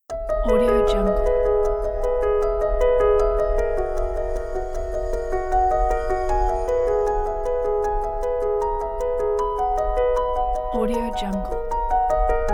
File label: an inspirational piano